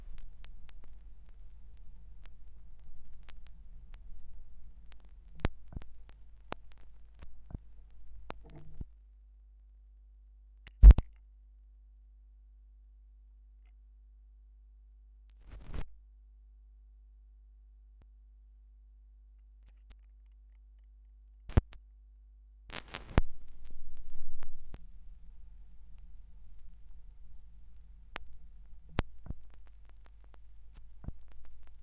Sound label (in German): schallplattengeraeusche